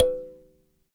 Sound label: Dyna Sansa, Africa; Roland LCDP11 Africa VOL-1; Sansas